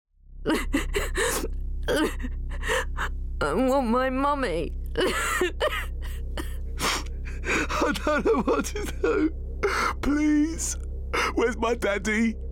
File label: KIDS CRYING